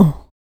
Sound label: HIT, Jazz FX FEM PERC